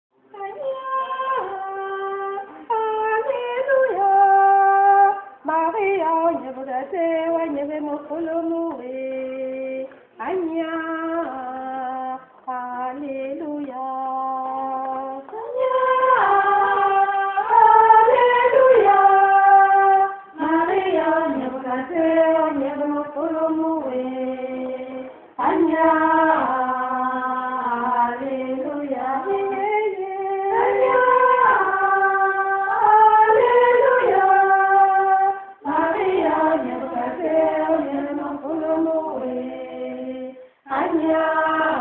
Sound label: Vocal